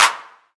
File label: subtle reverb hand clap c sharp key